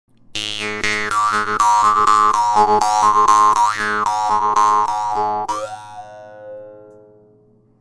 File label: doromb